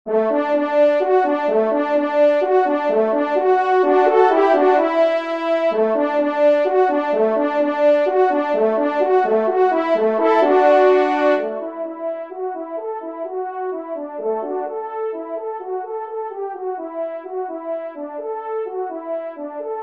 Allegro de chasse, trompe; 1e Trompe

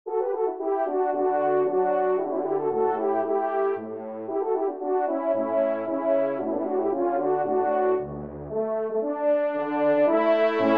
Trio Cors

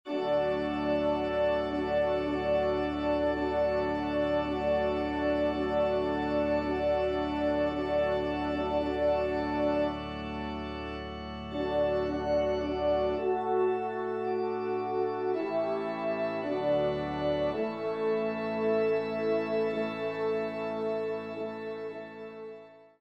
Trp Org; messe cantin

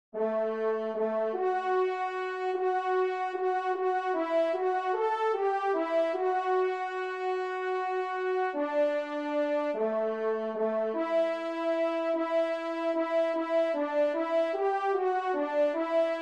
Kyrie TRP; Devert; Kyrie 1e Messe

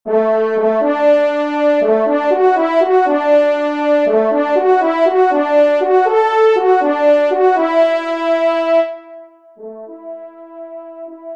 trompe; Dans la Foret

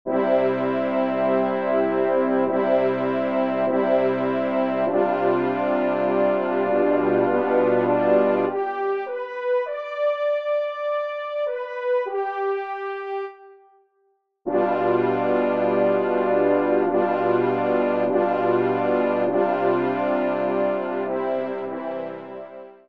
Sextuor Cors; Dauprat; Auteur : Louis-François Dauprat (1781-1868)

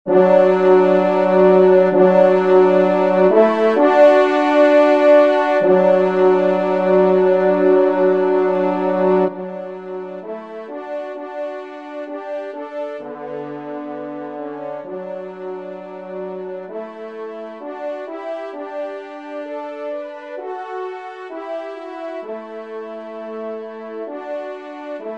Offertoire TRP; Devert; Offertoire 1e Messe

